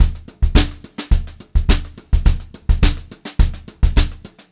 HOTROD BEAT, Brushed Drum Loops

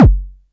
kick, Korg ER-1; Kit 16

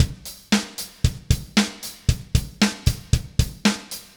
Haze Beat, Indie Rock; Sonic Foundry (Sony Creative Software); Indie Rock Anthems; Session 06 - Haze

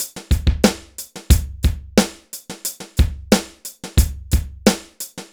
BPM 90 DRY; AKAI S-Series CD-ROM Sound Library VOL-4

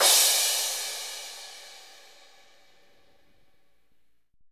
BRT CRASH, Drums; FILM KIT; Drums In Your FACE!

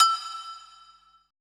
HI TUBE E2, PERC; BPC PERC

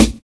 TEKHOP SN, HIPHOP; Drums In Your FACE!

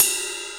RY30 Ride; Yamaha RY30

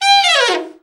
BRA ALT FALL, SAX; Best Service Gigapack 1

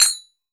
Sagat Mid, Cymbals; Roland BK-5; Percussion; Gongs

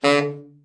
TENOR, Sax; Giga Samples Collection

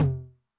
Noise High, 909, MID TOM

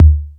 Beat Box, E-MU Pro-Cussion

